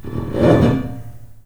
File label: LOSCRATCH, The Piano; FX; InVision Interactive